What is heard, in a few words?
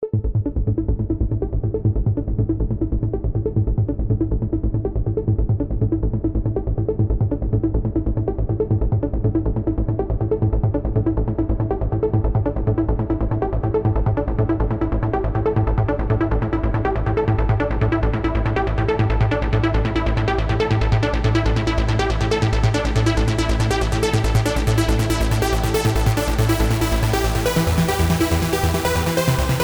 Arp